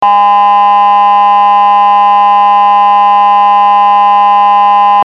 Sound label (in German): Sinus 816 und 1020 Hz mit overdrive warm; Viele neue Töne sind nun entstanden. Das Ganze hört sich unangenehm anders an; Der tiefste Ton in diesem Gemisch ist 204 Hz, und diesen Ton hört man deutlich!; Seine Frequenz ist die Differenz der Frequenzen der beiden